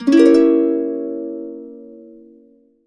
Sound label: HC EDIM7, Complete Harps; USB Soundscan vol.62; AKAI